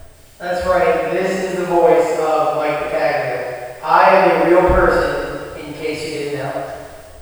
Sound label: real person d noise